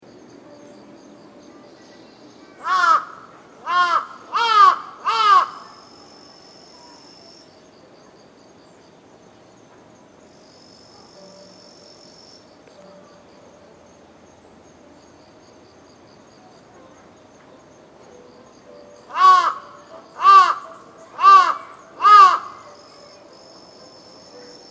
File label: Amb Tokyo Japan Bird Crow Caws Insects Music; Tokyo, Japan, light outdoor ambience with crow cawing close up